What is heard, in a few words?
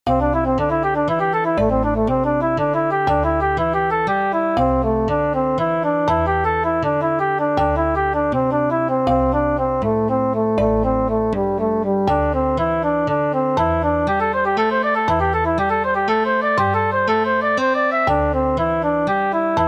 changing rhythm 2o4, 3o4, 4o4